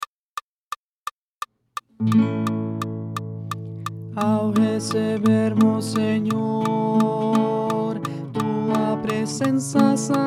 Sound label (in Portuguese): Barítono